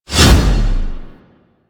Army Proj Throw; Warframe; Warframes; Hoplite

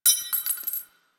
Belt Fed Rifle Links; Weapons; Warframe; Tenno; Frumentarius

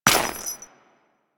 Step Ice, Footsteps; Foley; Warframe; Grineer Royal Jester

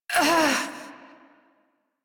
Struggle Long; New War

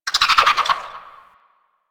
Landscape Cave Creature Calls, Ambience; Warframe; Venus Open World; One Offs

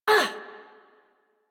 Pain, Adult; Warframe; New War; Adult Operator

